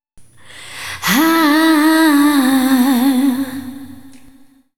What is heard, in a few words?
AAHHH, Vocal